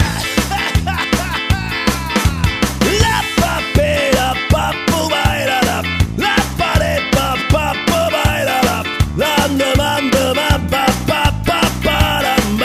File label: example voice live